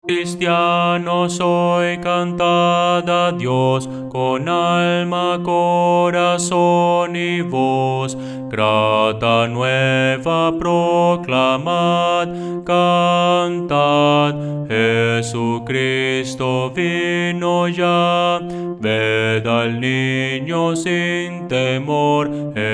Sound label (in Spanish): bajo